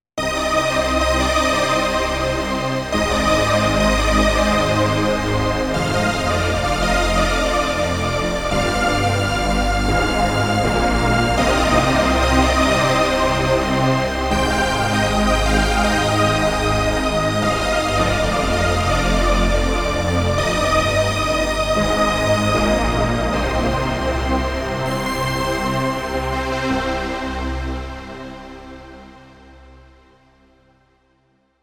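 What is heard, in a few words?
akai synth strings; s3000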